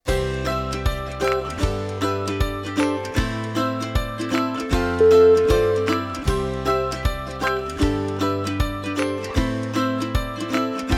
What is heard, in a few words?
happy ukulele